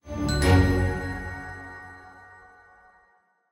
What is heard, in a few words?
Stingers Skill Tree Node Unlock 148BPM; Fortnite